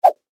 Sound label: menu open